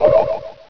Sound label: ghost